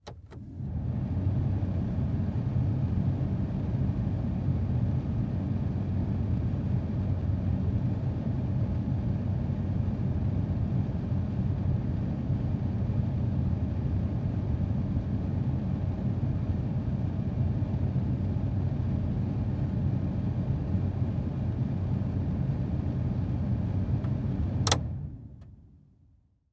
Vent Full Size Van Air Conditioner Vent Second Notch; transportation